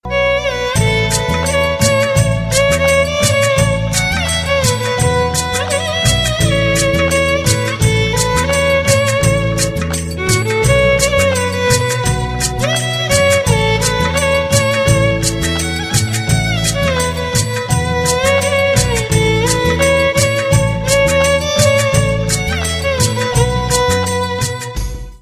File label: instrumental ringtone